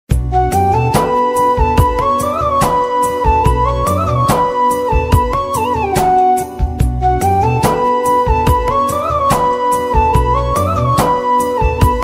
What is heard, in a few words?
new ringtone love ringtone